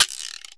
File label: GUIRO, Drum Machines, Akai MPC-3000